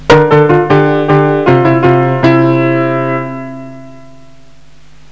PIANO

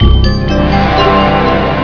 WIN START

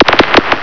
CRACKLE

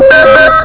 PHONE; psion cd vol1

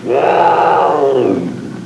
LYNX; psion cd vol1

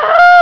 KRRRU; psion cd vol1

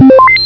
Computer Beep

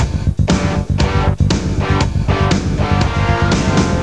E-Guitar Sound; psion cd vol1

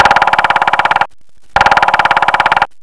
Woodpecker; psion cd vol1

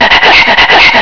PANT; psion cd vol1

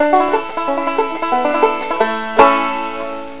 BANJO

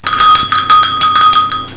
CLATTER; psion cd vol1

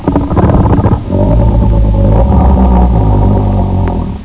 GROWL; psion cd vol1

